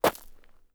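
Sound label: gravel, steps; mining sounds; Terrarum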